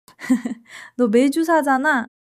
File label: laughter